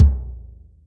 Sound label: 2 Hed Med, Yamaha DTXpress Acoustic Kick